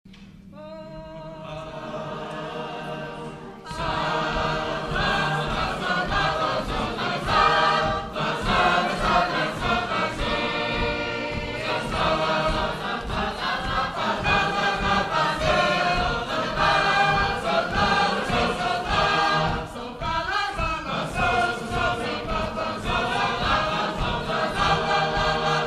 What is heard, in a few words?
Sacred Harp; Portland; 2006 Convention; Edom